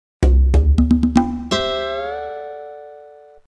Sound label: flourish